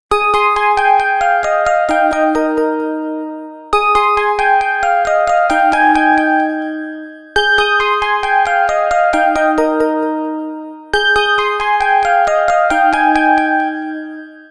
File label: Ring Fancy Bells, Windows Mobile ringtones; Microsoft